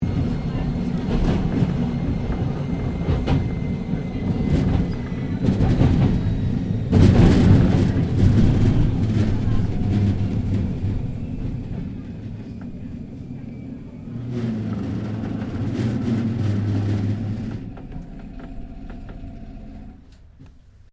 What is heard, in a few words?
Tram W Class Interior Stopping Bad Brakes, Melbourne Trams; Streetcars; Vehicles